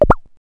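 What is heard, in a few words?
double pop, pops; Wired for Sound Pro CD; Aristosoft, Inc.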